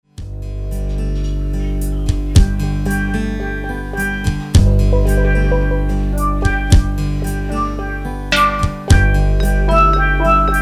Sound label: Steel Drum